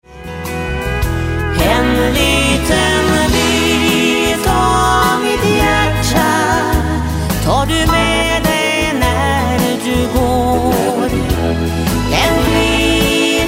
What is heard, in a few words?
country parlor